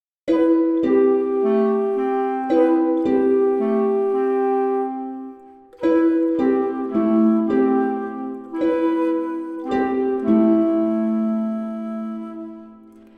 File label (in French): harpe flute clarinette; temples